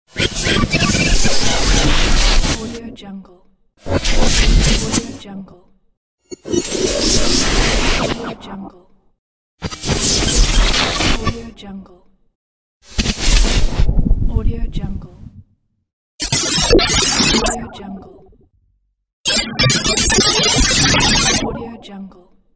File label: radio imaging